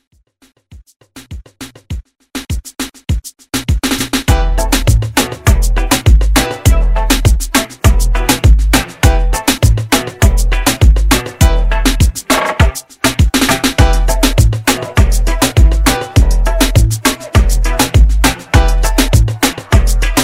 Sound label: Reggaeton Redrum